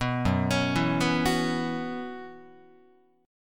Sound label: Gb 7th Suspended 4th Gb down Guitar, chord; Gb7sus4 arpeggiated